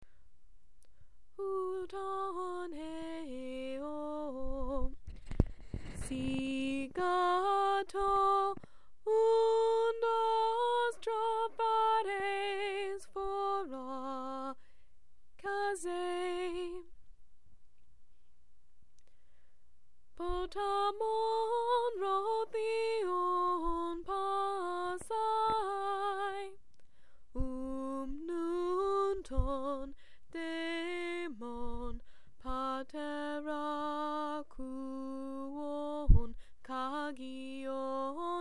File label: Sung Hymn, Greek; GreekSounds